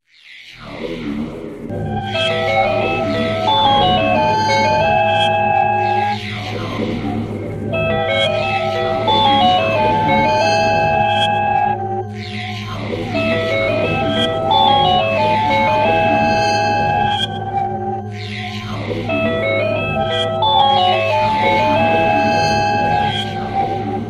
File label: 3D Space Ringtone